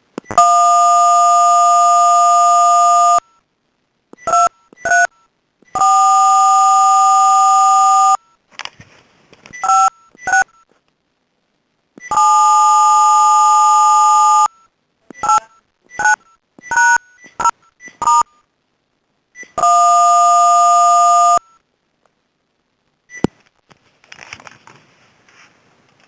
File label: long dtmf tones